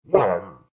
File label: grunt; Cog